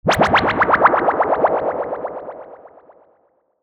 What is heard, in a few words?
dub fx